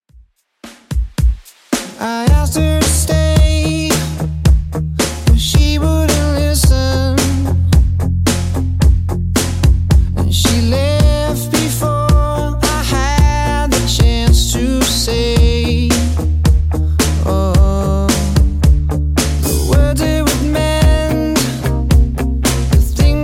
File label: Alternative, 110bpm